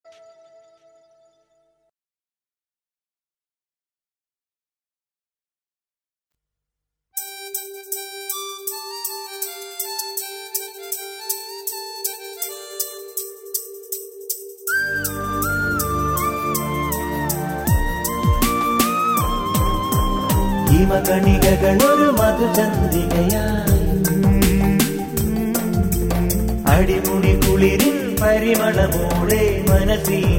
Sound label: Malayalam; Airtel